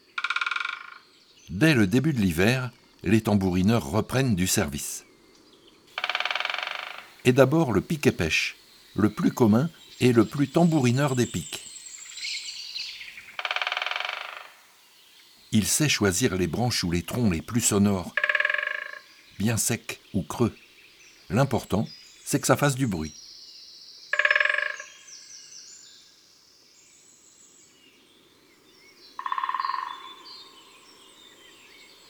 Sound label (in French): Le Pic epeiche; chants d'oiseaux